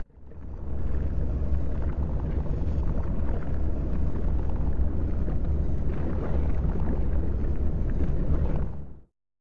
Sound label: Pigvulcan